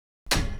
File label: interact door close; Opsive; UltimateCharacterController